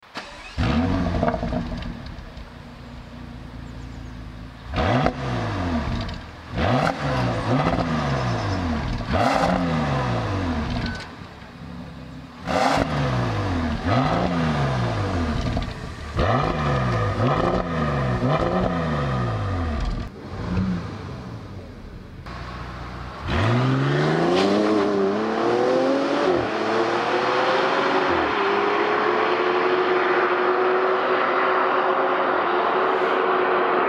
Bentley Bentayga

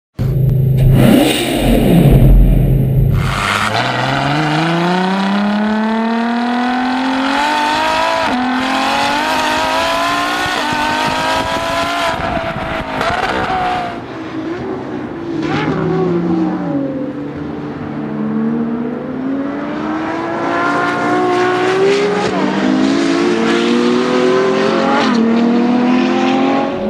LaFerrari

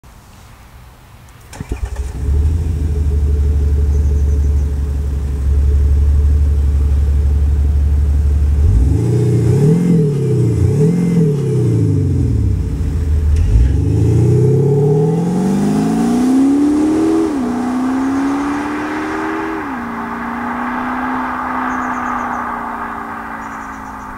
Audi A7 Sportback

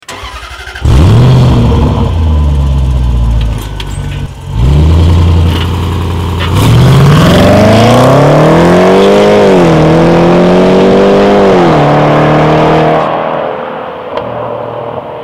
Range Rover Sport

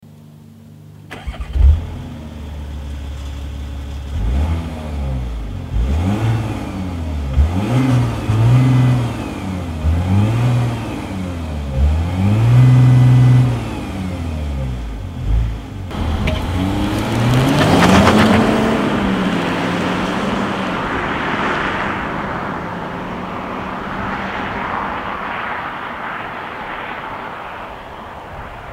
Porsche Panamera Diesel engine; cars

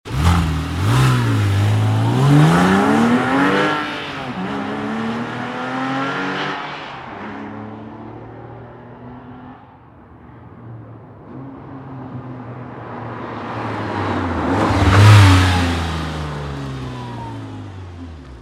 Porsche 911 Carrera S Cabrio engine sound

